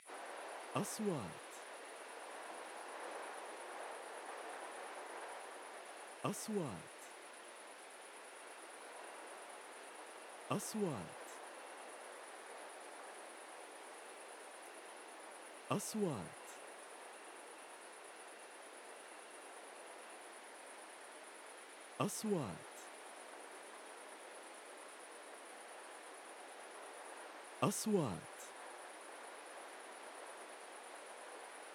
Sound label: The voice of the outbreak at night